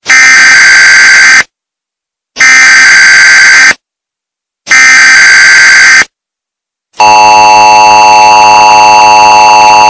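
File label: emergency alert system alarm; eas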